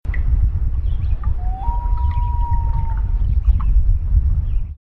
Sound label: loon